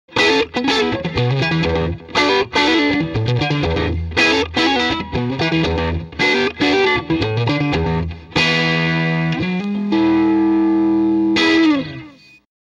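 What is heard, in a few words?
m75 tele neck pickup jtm45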